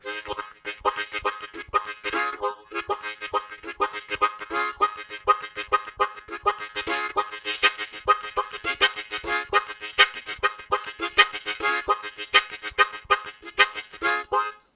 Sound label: Relentless Syncopate, Harmonica Riffs; Zero G